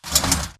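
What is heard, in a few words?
Stalker Movement Servo Damaged Walk; Titanfall 2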